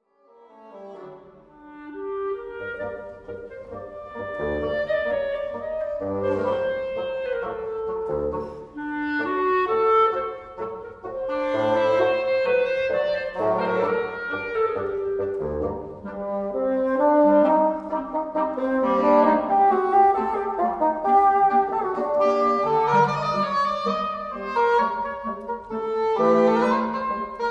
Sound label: Trio Tango